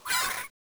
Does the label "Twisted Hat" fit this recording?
No